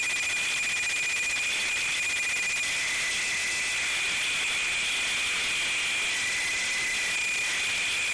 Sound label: Hide static sound